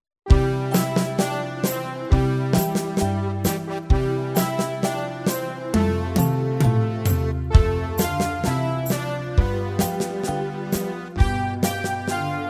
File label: Jinglebells